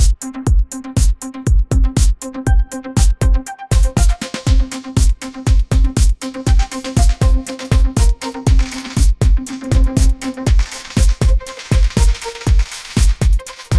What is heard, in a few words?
sunshine pop groove, ringtones; ai